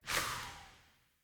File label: Sparrow Death State One Wing Flaps; Animals